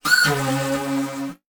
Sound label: Multimedia Internet CD-Rom Flash Animation